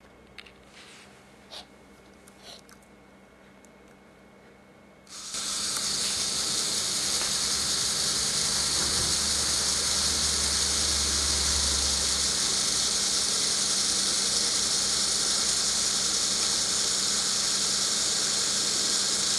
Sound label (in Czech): robotek